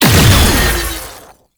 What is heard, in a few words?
Research Pod Shield Destroyed; Warframe; Jade Shadows; Ascension